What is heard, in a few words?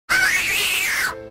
crazy cat; scary sounds